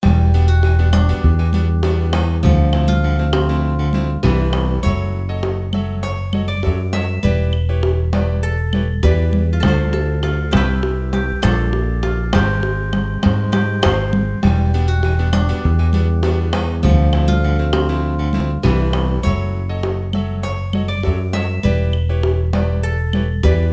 Lose

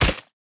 Squished Crush; Boomerang Fu

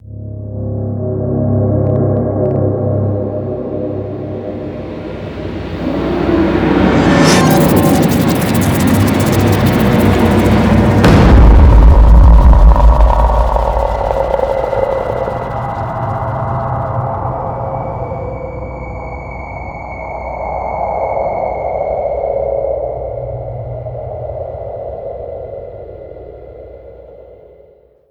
Power Up Music and Sound; Colossus

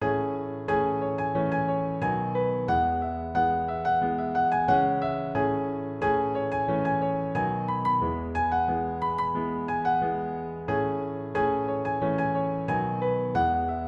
Piano app by Yokee

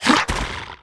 Monster Medusa type Death; Inotia 3

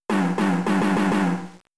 Belly Drum; Pokemon; Gen 3; attack moves; RSE

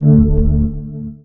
player heal Medkit

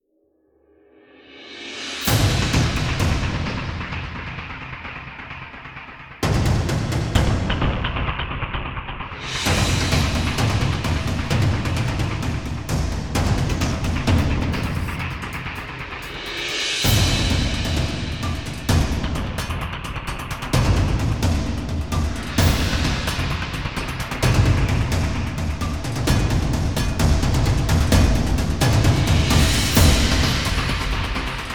Music Danger Alert Perc Only; starcraft 2 wings of liberty; MissionMusic

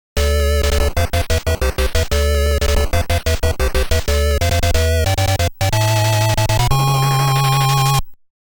Final Ranking Announcement Fanfare; Nekketsu Kouha Kunio-kun Ongakushuu

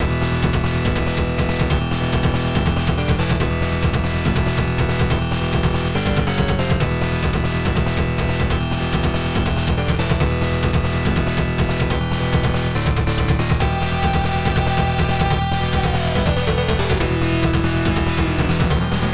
Battle; Shin Megami Tensei; PC Engine Super CD-ROM2

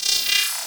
Glitchedtones Transition; Afterbern Democralypse; Windows

